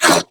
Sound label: Pain Female; New War; Narmer Grineer